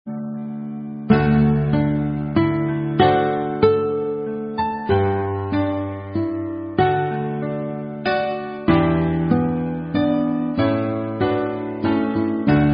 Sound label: Karaoke Songs; Girl Scouts